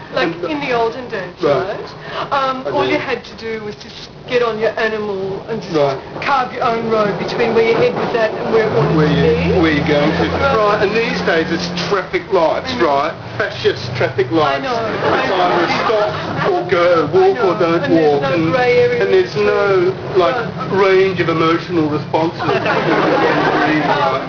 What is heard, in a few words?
traffic